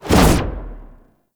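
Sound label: Wall Climb Invisibility Start; Warframe; Oraxia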